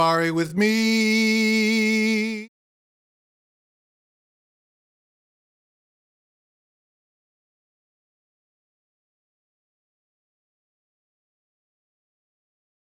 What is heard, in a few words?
Vocal